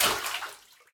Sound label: sewer land; Golden Crayon Sounds